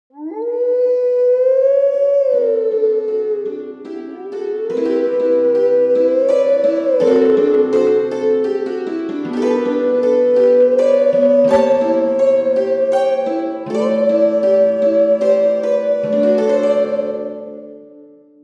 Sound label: Howling Stone Lullaby